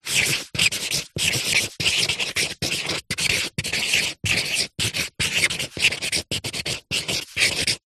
office pen highlighter write on paper on cardboard